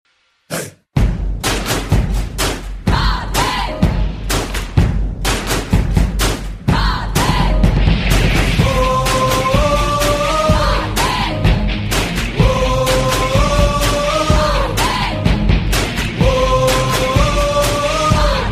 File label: Woo Groovy